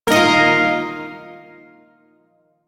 Shawzin Blues Chord; Warframe; Duviri Shawzin

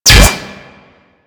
Bolt Impact Metal; Warframe; Weapons; Cross Bolt

